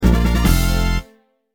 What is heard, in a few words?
Fanfare Get Item; Ranger